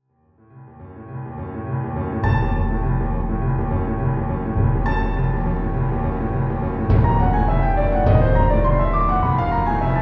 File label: Suspense